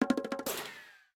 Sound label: Cartoon Unlock; Pantech; Discover P9090